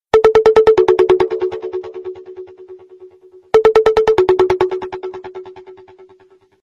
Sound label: S M S Tone